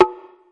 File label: LOG DRUM, Roland TD 7; drum machines